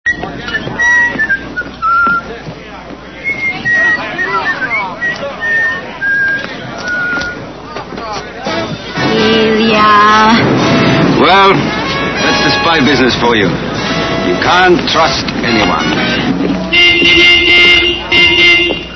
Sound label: gazebo whistle; Her Master's Voice